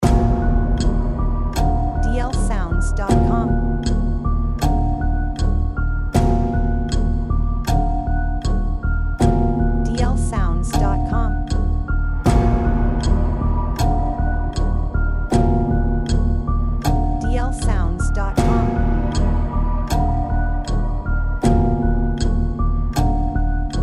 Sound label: Running Out Loop